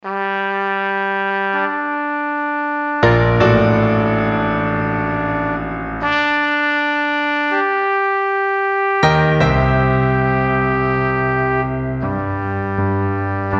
with trumpet, trumpet and piano

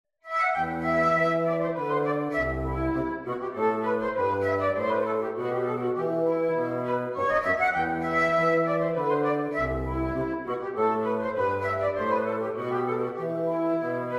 Theme, Hornpipe, Wind Quintet

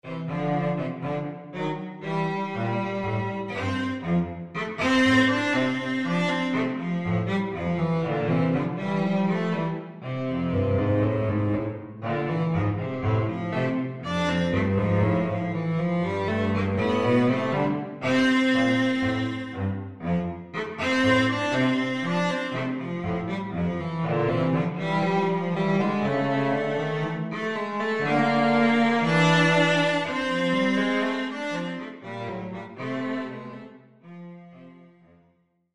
Ragtime

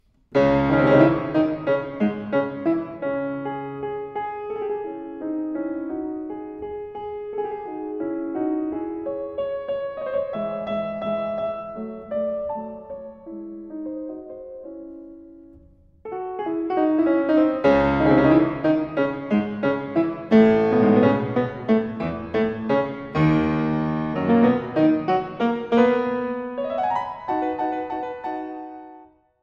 Sonata in C Sharp Minor

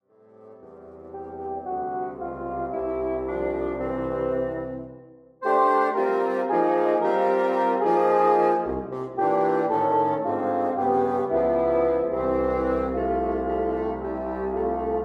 Big Band Style; O Come All Ye Faithful

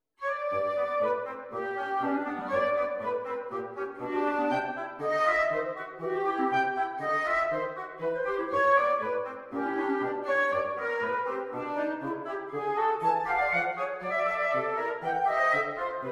Excerpt Piu presto galop

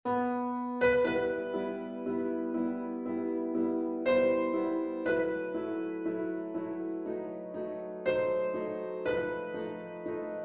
Prelude in E minor; Chopin

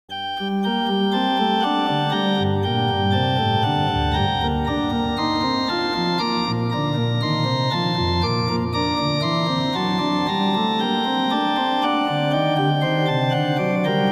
Christmas Concerto; Corelli

